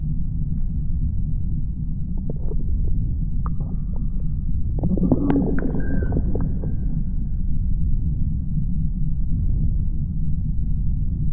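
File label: voidspawn idle loop; Stellaris; reanimated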